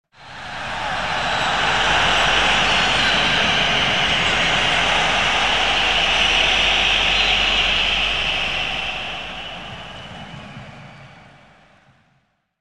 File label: Crowd - Catcall - Large Audience Reaction Very Large Sports Stadium Crowd; Hollywood Edge; sound designer's toolkit v2